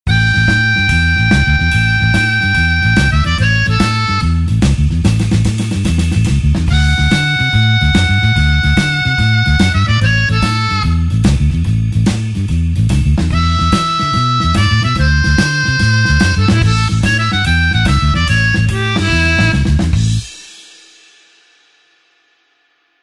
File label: blues harp shuffle A